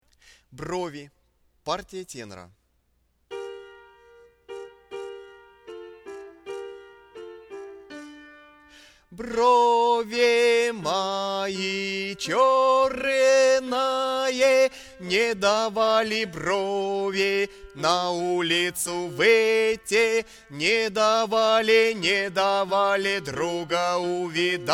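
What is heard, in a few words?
brovi tenor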